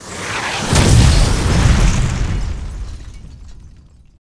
Gun explo; impacts explos

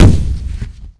Launch; Quantum Redshift; kukai

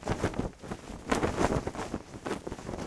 flag, ambient; Cars; XBOX

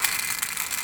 Robot Oneshot; BedRoom

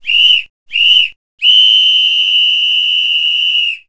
Ref Whistle Full Time

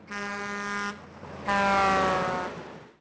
Amb Doppler; Cars; XBOX; Ambient

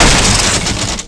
hit gates; hits; Tony Hawk's Project 8; XBOX

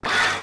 animate out, menu; Tony Hawk's Project 8; XBOX

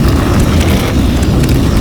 grind plastic, terrains; Tony Hawk's Project 8; XBOX

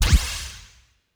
PUSH PANEL; Pump It Up Exceed

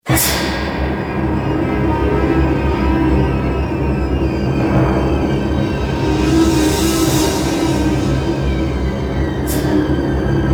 slow, music; Soldier Of Fortune II Double Helix; XBOX; kamchatka

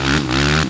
sput, Engine; 450

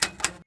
Rotary, switch; Max Payne

